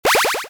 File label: start; Parking Boom